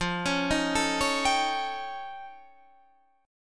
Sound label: F Augmented 9th F, chord